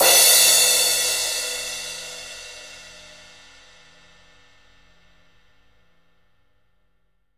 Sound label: crash, rock drum kit